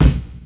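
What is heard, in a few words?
bass drum funk, drum kit; E-Lab; X Static Goldmine 2; akai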